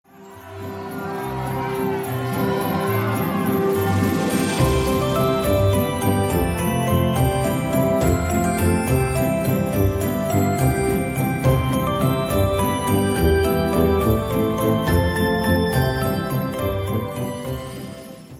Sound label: unicorn race timer